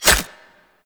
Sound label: Infested Packet Claws, melee attack; Warframe; Infested Lich